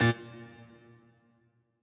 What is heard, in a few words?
piano riff intro